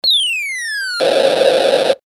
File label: digital drop down